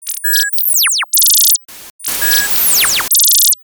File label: compressed